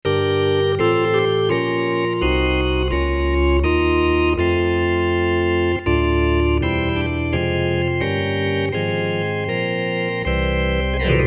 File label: Hammond Dry; MovingSources